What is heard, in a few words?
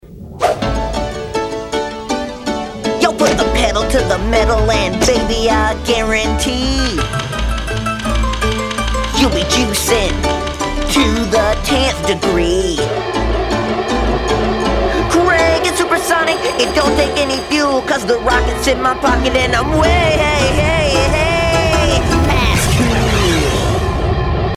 Piano; Sonic The Hedgehog SatAM Soundtrack and Unreleased Themes